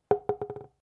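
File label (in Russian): звук отскока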